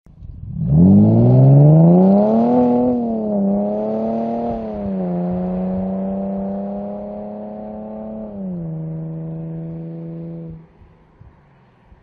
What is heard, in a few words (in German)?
6 Zyl Losfahrt